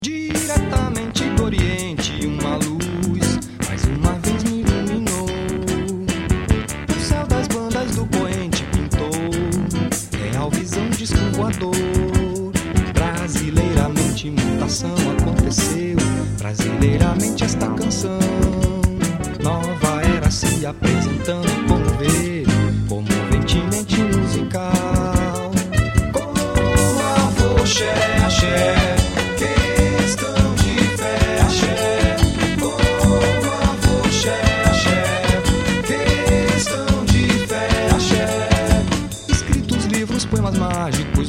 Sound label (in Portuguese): afoxe vinheta